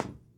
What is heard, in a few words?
shoot, billiards; monkey2; PoolMod